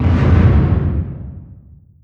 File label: distance light cruiser attack; Hearts of Iron IV Death or Dishonor